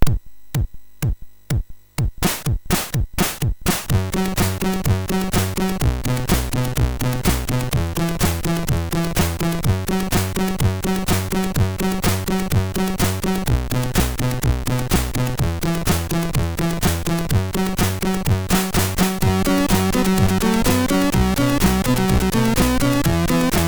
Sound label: Disco, sid MOS6581R3; Signor